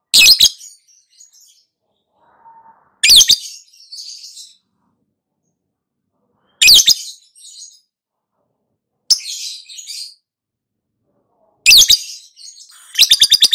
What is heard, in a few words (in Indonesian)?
masteran lovebird